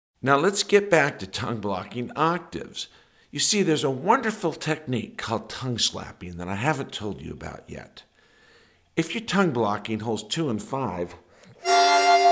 Tongue Slapping, harmonica

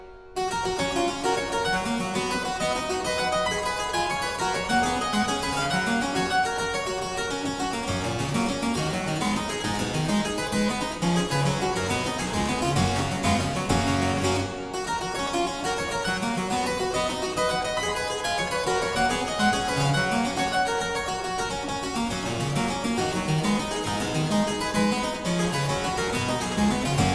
Aria Variations in G Major, Harpsichord

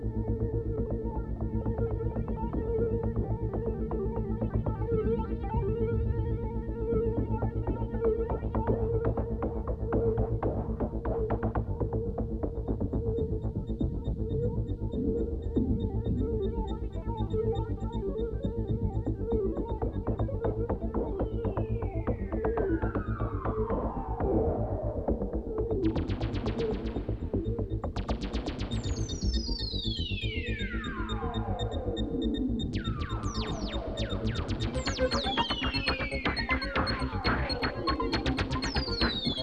Meteor Storm

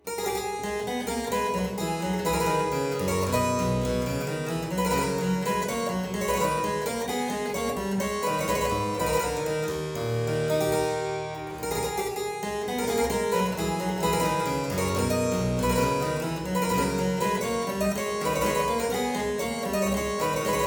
Courante in A minor - Variatio, harpsichord; Buxtehude; Complete Harpsichord Music; Courante zimble; BuxWV245

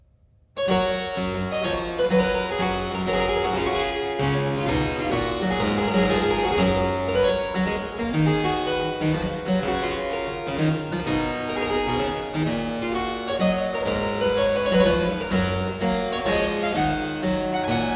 Corant, harpsichord; Complete Chamber Music; Brilliant Classics; Miscellaneous pieces for the harpsichord

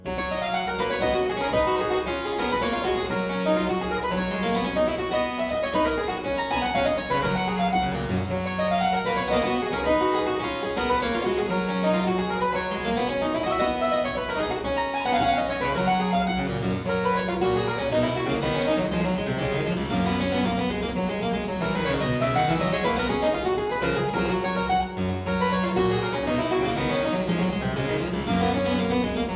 Aria in G major Partita 23, Harpsichord

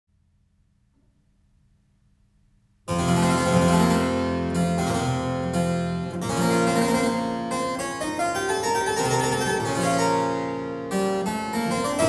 Preludium (harpsichord); Byrd

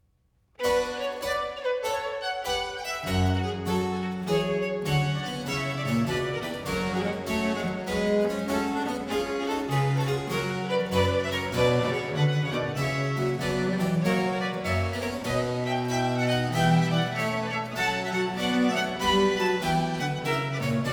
Sonata IX in C minor Z 798 Allegro; Complete Chamber Music; Brilliant Classics; Twelve Sonatas of three parts (1683)

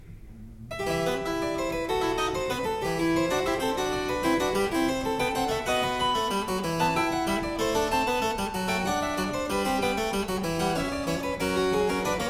Suite in E Minor - Courante, Harpsichord; Suite No. 4; HWV 429; Handel; Suites for Harpsichord

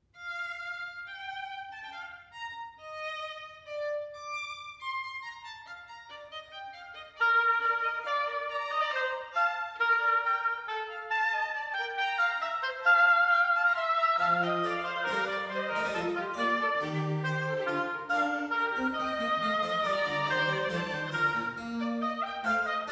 Oboe Concerto in B flat major - Fuga. Allegro; Handel; Oboe Concerto No. 2; HWV 302a

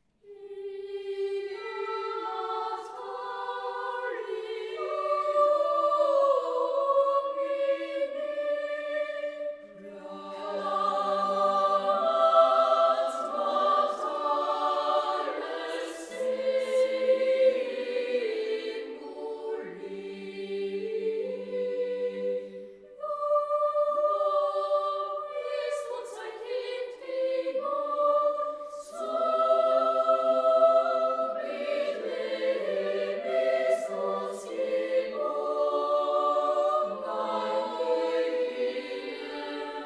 In natali Domini (Arr. for Choir); Sacred Choral Music

